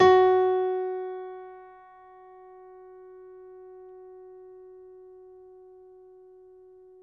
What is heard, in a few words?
piano; East West; The Ultimate Piano Collection